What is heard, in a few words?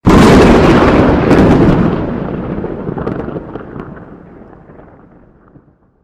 lightning; Jungle-War